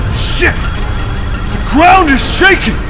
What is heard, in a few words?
ground shakin